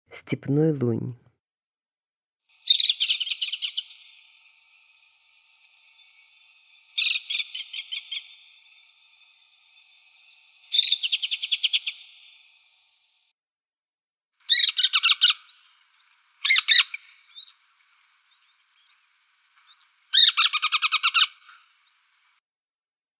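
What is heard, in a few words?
stepnoy lun golos, pallid harrier voice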